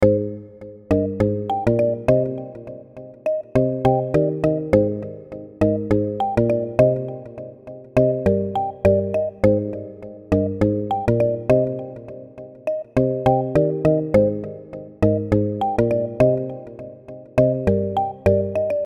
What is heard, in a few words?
pianos